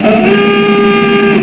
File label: Claxon; Elektronik